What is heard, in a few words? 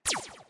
Fighter Laser